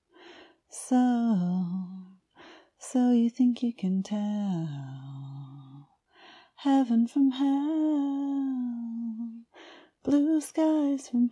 A cappella

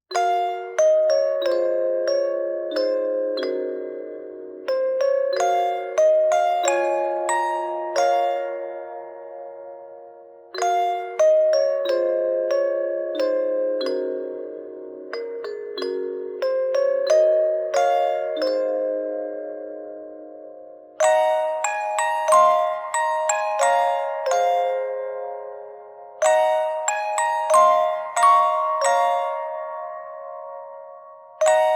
Lullaby Instrumental

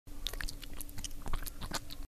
Suck; Lewd SFX

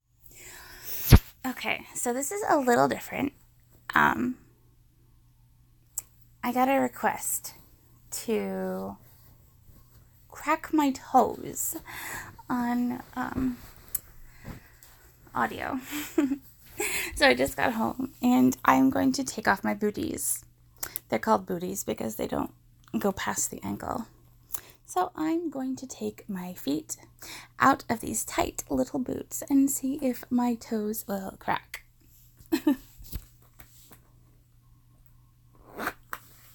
Toe cracks

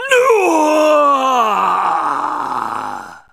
Grunt; Lewd SFX

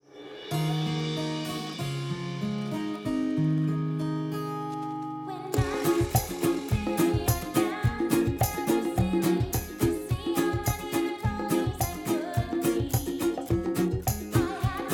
harmony instrumental; My Little Pony OST Repository; Season 2; Bonus Music

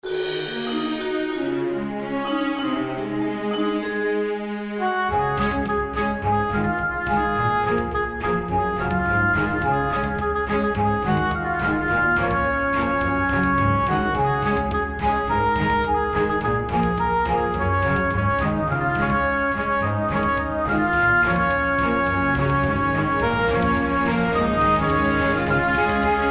Orchestral Remix

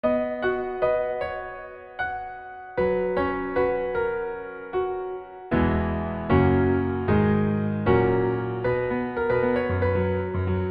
Piano

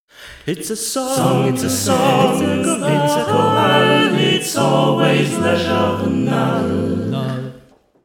jingle

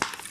Sex Smack

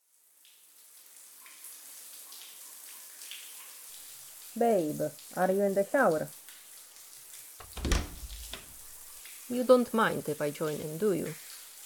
licking sfx; Monster Wife Series The Orc

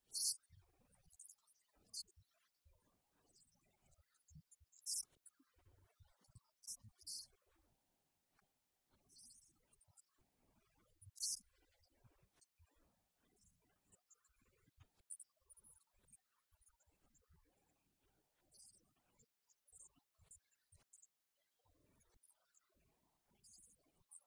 Singing a Danish lullaby; Solen er saa roed mor

